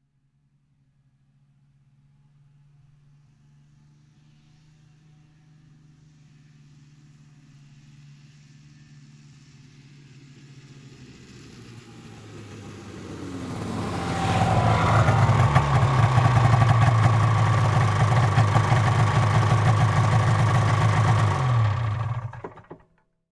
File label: Biker approaching and turning off engine